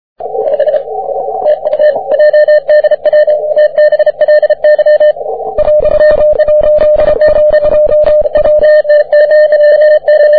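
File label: 40m continuous wave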